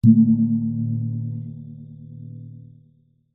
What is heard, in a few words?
musical instrument gong gamelan hit soft